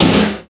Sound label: SNR INDUS, snares; Roland L-CD701; Snares 5; Sn Modules 5